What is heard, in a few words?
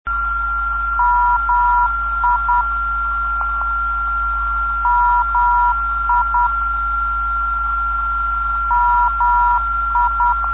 mi 355khz, NDB